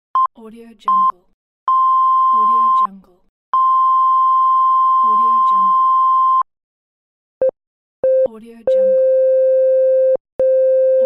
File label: beep; tibasound